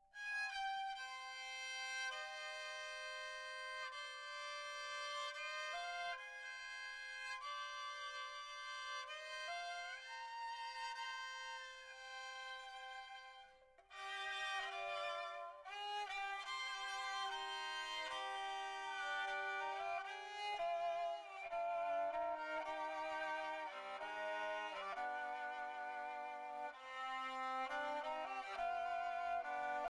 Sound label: Sari Galin Variation